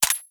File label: Rifle Mech; Warframe; Weapons; Tenno; Sundial